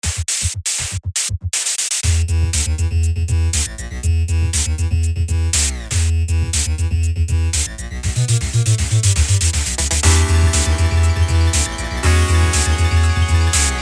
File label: Area Bats Boss